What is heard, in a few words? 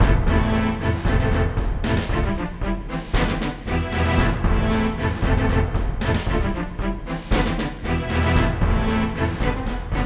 Duel Music Neutral